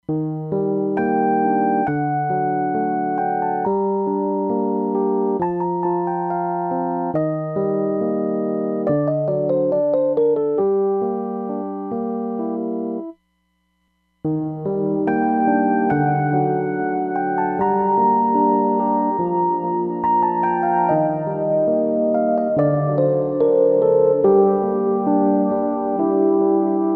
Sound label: Chorus Reverb; Roland DEP-5; Factory Patches